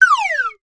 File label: comedy whistle slide down; sound effects one